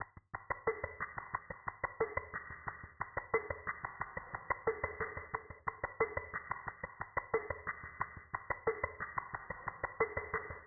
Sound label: Drum Loop, 90 BPM Percussion; Loop Stems; Cymatics; Vibes II Drum Loop Collection; Sail